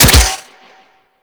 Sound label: Bow Shot; Warframe; Weapons; Tenno; Daikyu Bow